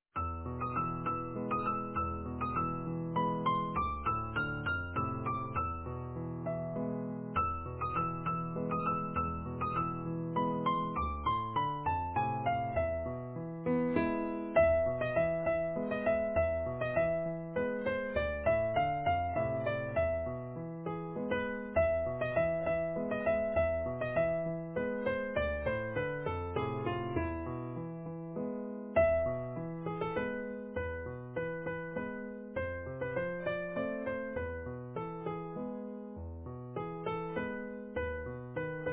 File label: Easy Piano